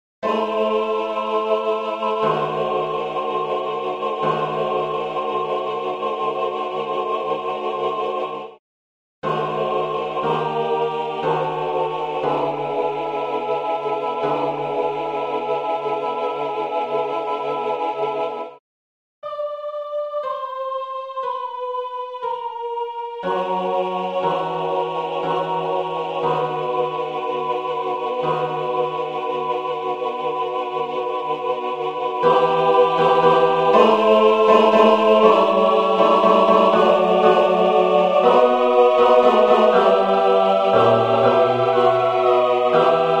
Qui tollis peccata mundi Tutti; A.Vivaldi

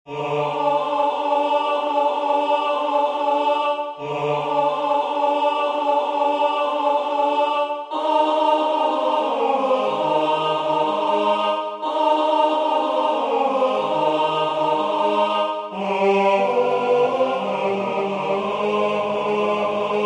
Holub na ja vore Tenor2; A.Dvorak

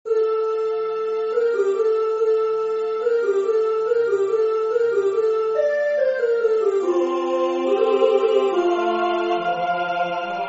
Tutti; G.B.Pergolesi; Laudate pueri

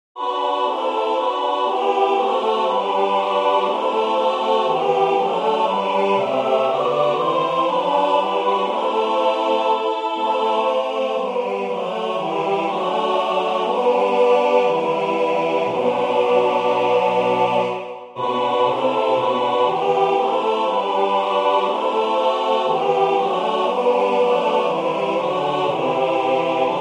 Tutti

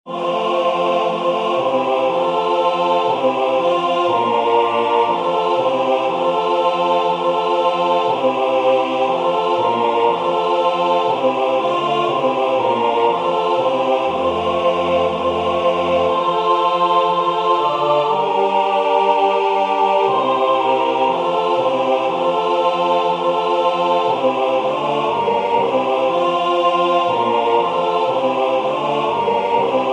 Tutti; Alta Trinita beata

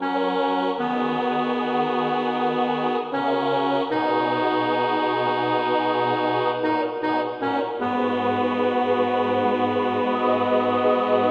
The blue bird Tenor; C.V.Stanford

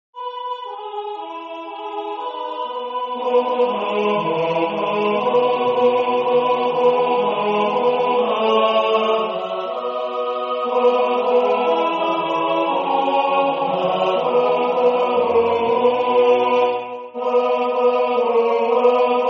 Chorus Tenor; H.Purcell

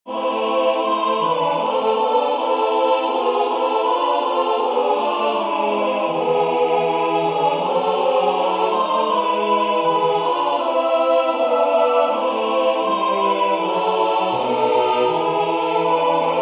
Tutti; Shalom aleichem

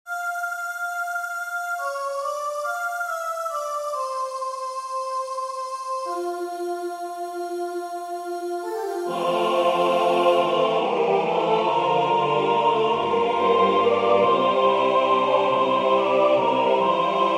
Tutti; W.A.Mozart; Laudate dominum

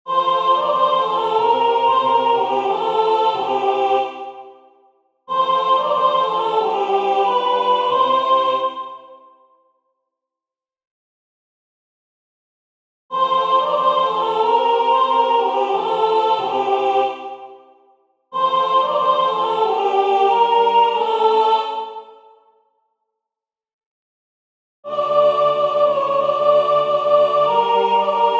Dyby byla Kosa nabrosena Sopr; A.Dvorak

